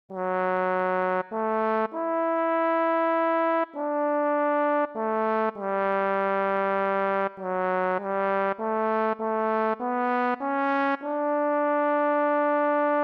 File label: Lead, barbershop tags